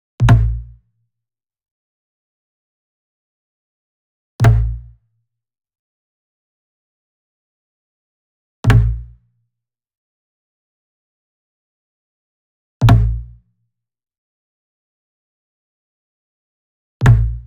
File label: Wood Percussion Log Drum Traditional Flam Near; boom library; Cinematic Strikes Construction Kit